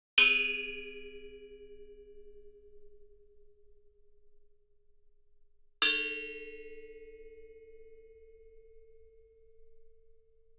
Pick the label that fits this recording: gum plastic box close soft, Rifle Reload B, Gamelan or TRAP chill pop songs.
Gamelan